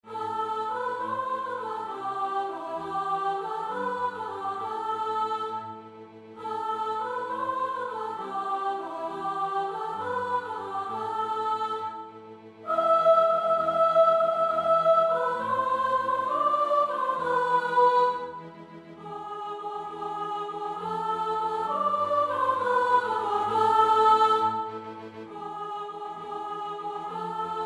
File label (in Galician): Panxolina Sop